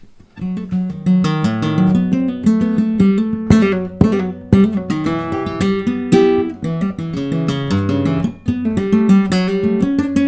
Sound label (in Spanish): falseta